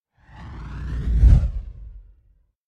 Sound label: Fire Ball Passing Flying Fire Ball; Hollywood Edge; sound designer's toolkit v2